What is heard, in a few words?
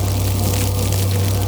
frost flamebreath; LoL; shyvana